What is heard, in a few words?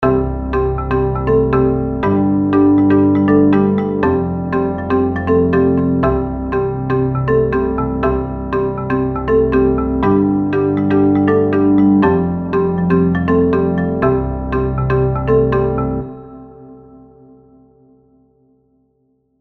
EZkeys Synth Pop